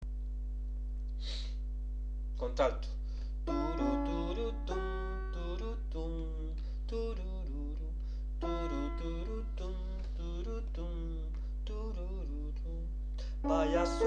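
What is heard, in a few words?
alto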